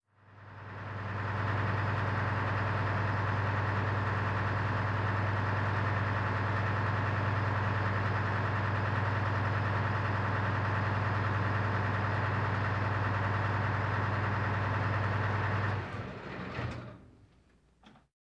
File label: APC Int Idle Off Mono, armored personnel carrier